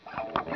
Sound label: SCRAPE; FUZZGTR1 VIB